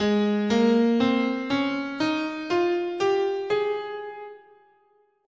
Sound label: Ab Ionian, scale